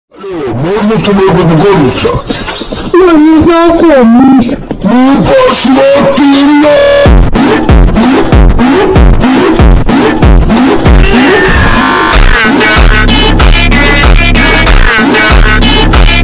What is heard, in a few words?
Phonk slowed